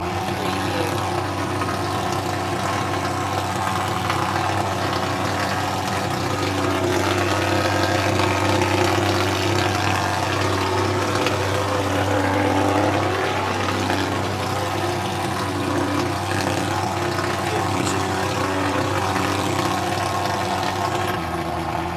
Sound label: smoke thrower; loop